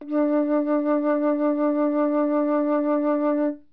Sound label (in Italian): flauto